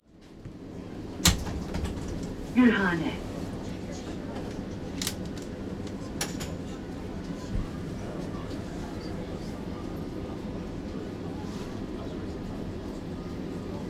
estambul tranvia t1; turquia; Oído Absoluto

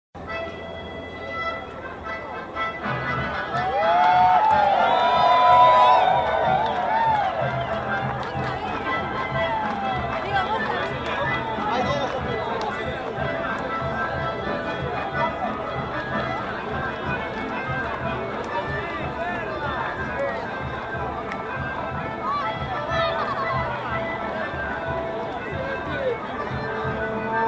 actos; ley 125 entidades agropecuarias

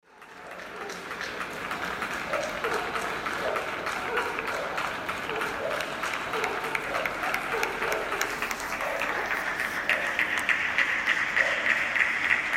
argentina aplaude covid

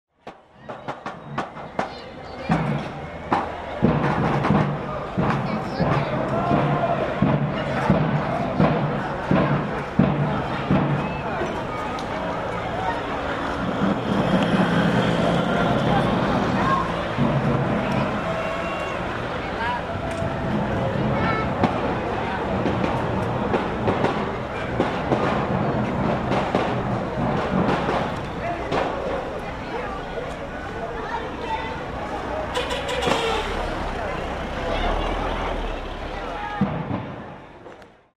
manifestaciones concentracion; sarmiento y mendoza

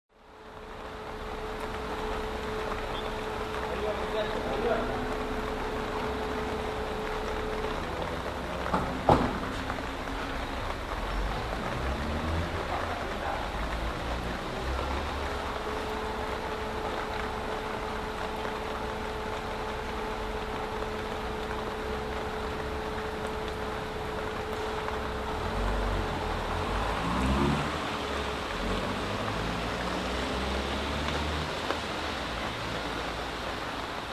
calles montevideo y santiago lluvia

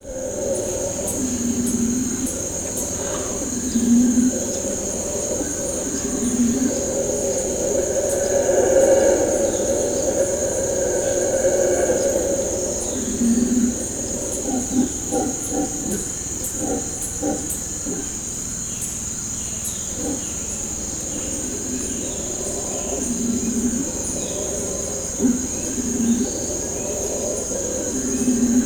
selva del amazonas; peru

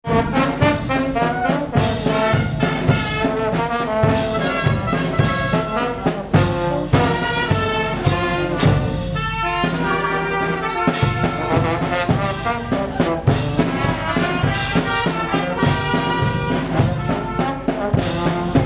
banda tapado del niño; bolivia; potosi

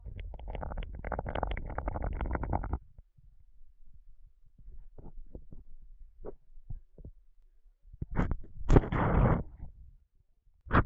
toma mate; Oído Absoluto

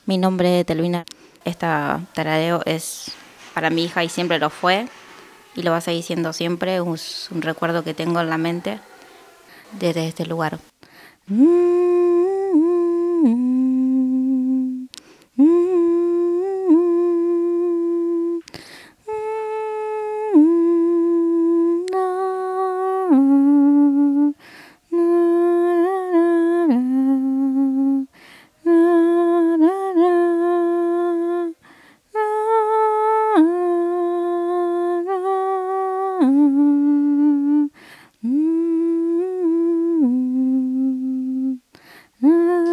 canciones de cuna

